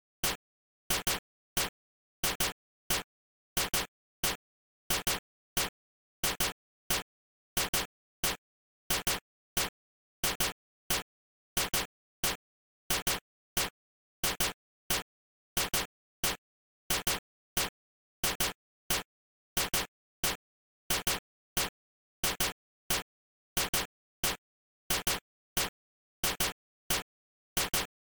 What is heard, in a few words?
beat